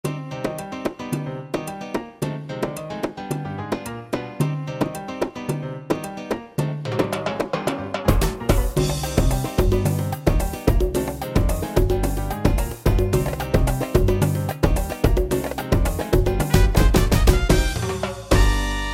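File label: Latinor C minor, Middle East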